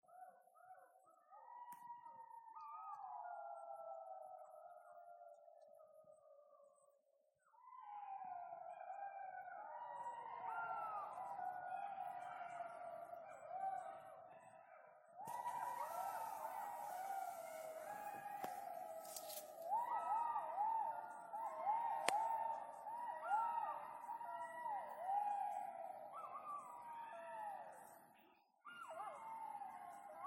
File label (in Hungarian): aranysakalok hangja, Šakal zlatý (Canis aureus)